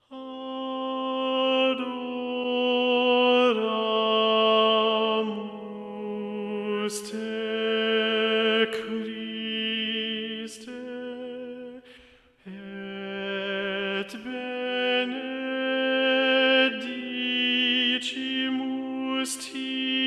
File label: Adoramus te T, choir tracks; Palestrina